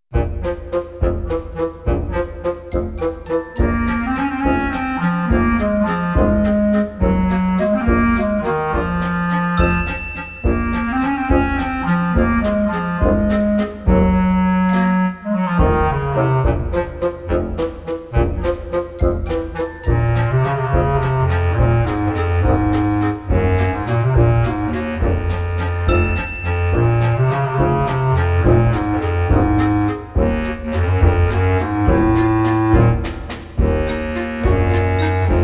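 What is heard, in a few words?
bass clarinet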